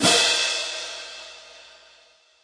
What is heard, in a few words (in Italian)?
Piatti, Roland TD 7; DRUM MACHINES